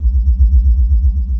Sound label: ship amble, Rumbles